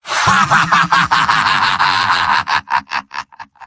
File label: medic laugh long; mvm